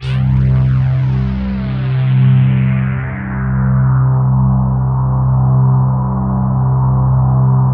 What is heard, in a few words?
RICH HARM; Greytsounds - Scott Peers Sound Engineering